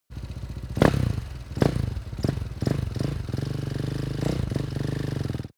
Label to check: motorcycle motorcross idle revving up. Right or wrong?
Right